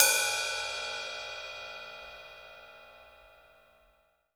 stick, hi-hats; Sampleheads; New York City Drumworks VOL-1

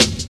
Steppy Cut, Jungle, Drum Loops 168.5; Zero-G Jungle Warfare 1, 2 & 3

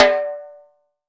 MAPLE SNARE

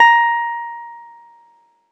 ZITHER; Best Service; Alpine Volksmusik

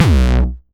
909 Grime Layer B1, Kicks; Mbase 11 Kicks

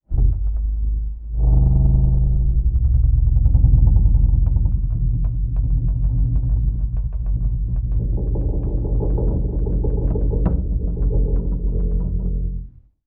granular sub; random and out of place

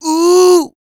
MALE HUMAN, Vocal Planet R&B & Dance; ILIO

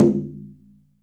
CHINA TOM; AKAI S6000 CD-ROM - Volume 5

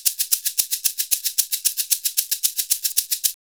SHAKER, Modern Percussion Loops; Soundscan vol.56; AKAI

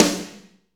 SD Real, Roland; Sound & Vision - Gigapack I

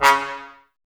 brass, Tbs Mute

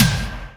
PERC FX, SYNTH PER

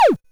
Drums, Hits; DCM8

